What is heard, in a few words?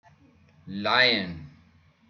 Lion